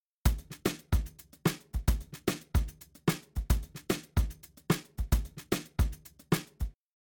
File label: Drums, shuffle drums; WDR2